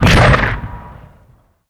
ambience weapon

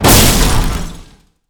metal break

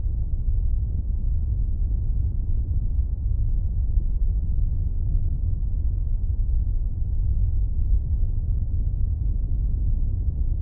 ambience large

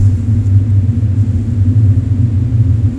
Wind Tunnel

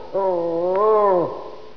wolf

